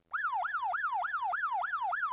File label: Tone Alert